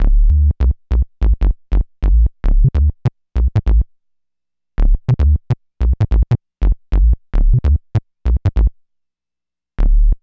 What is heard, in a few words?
BASS LP